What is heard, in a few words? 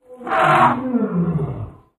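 Elephant Baby Trumpet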